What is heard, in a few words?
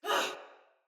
Surprised, Soldier Female; Battle; Barks; Dialog; Sinecure Soldier Female A